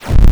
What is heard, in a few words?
boost; Republika Exhibtion Games; luftrausers